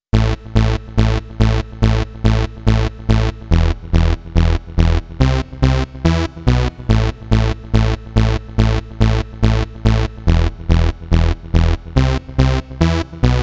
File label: Zombie Bass; ThousandMiles; sterixx